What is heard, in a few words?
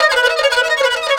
Chinese, Stringed Instruments; Sonic Foundry (Sony Creative Software); World Pop